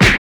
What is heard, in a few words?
Punch; Ed Edd n Eddy; Adromulus Pack; EEnE